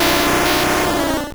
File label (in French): Cri